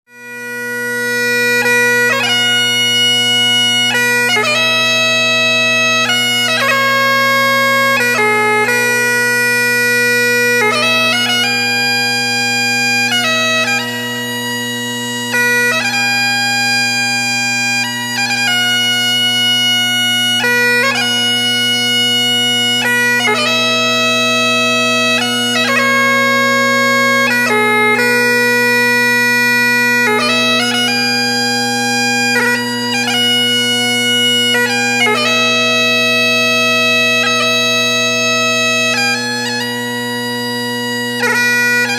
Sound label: on bagpipes; Scottish funeral traditions; The song itself is about leaving home, and the many memories created there.